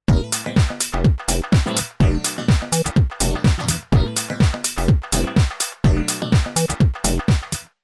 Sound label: Electribe SX House